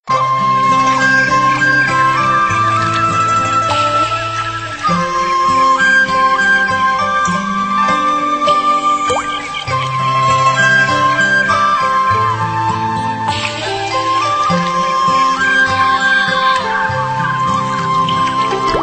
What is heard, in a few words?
spring pond